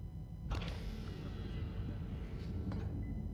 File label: Door Open; Trek; DS9 Prefect